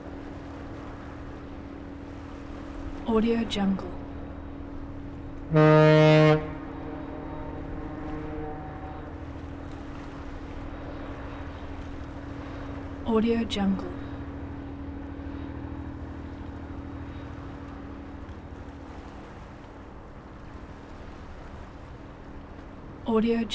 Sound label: tugboat slow pass by